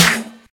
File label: snare one shot a sharp key, steel snare drum sample tuned to the A# note